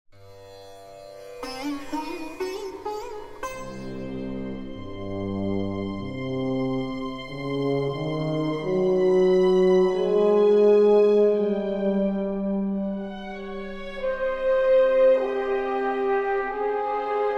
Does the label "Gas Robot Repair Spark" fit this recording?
No